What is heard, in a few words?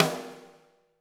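snare drum, Drums; Bob Clearmountain Drums I; SD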